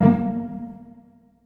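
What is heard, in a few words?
cello pizzicato A#2, Virtuoso Strings; ILIO; Kirk Hunter Virtuoso Strings VOL-3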